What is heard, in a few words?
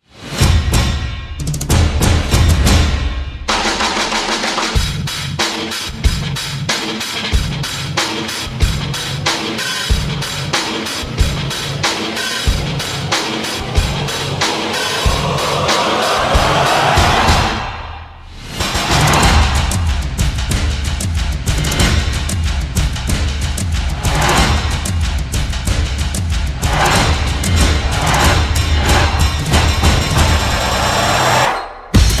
Drums Only Version